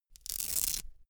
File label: velcro open cap